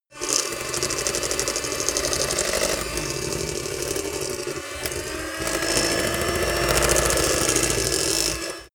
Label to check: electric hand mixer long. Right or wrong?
Right